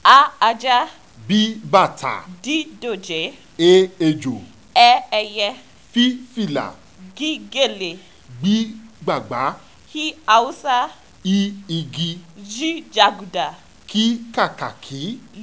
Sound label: ALPHABET RHYME, Yoruba